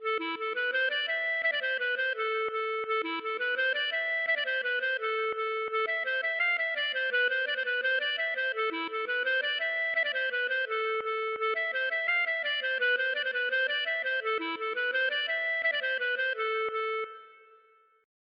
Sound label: gavotte